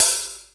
RX-5 HH OP; HI-HATS

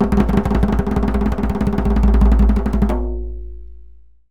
PERSIAN ROLL

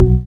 SYN, dance; Sound & Vision - Gigapack II

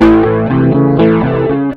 rock, acoustic guitar loops

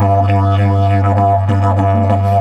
DIDGE 100BPM, Didgeridoo

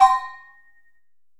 FX BELL, PERCUSSION; AKAI S6000 CD-ROM - Volume 5

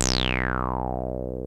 303 REZ; Pro-Rec - Synthsonic

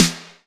snare mod; snares

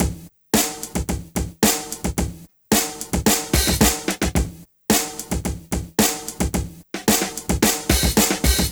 Street Tip, R&B Drums

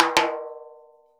100 TIMBA, Modern Percussion Loops; Soundscan vol.56; AKAI

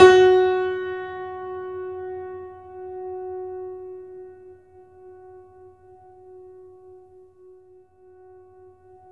F#, piano sustain; InVision Interactive; The Piano

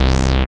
SYN 202, Roland MC-202; Sound & Vision - Gigapack I CD 2

